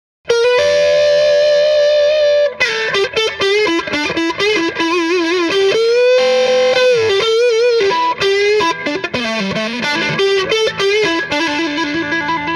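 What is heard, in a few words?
m75 lp mid pickup dist deluxe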